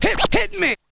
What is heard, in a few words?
scratch kit, vinyl